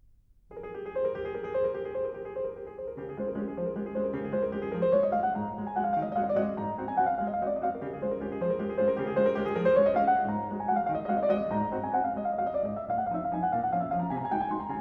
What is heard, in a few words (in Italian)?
Valzer in re bemolle maggiore, Piano